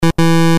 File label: error; Zelda3